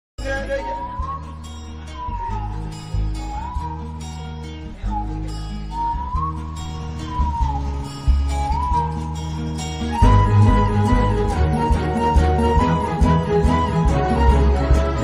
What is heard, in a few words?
bgm bike ride bgm